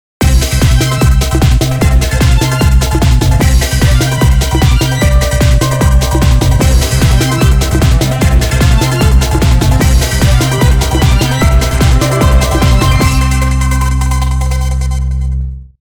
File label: Cartoon; gekiban